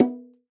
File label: Bongos; Cymatics; Emperor World Percussion (Teaser)